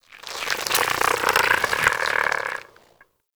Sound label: Plant Smoke Stack Creak; Ambience; Props; Warframe; Venus Open World; Venus Landscape